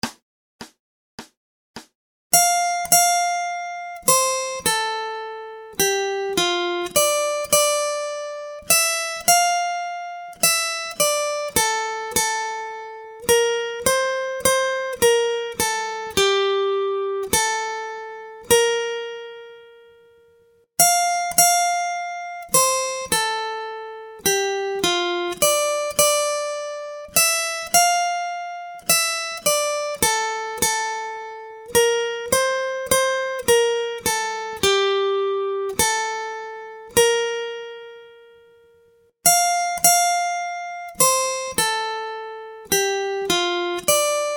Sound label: Acoustic Accompaniment, acoustic guitar